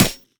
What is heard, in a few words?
Weapon Impact; DOTSSample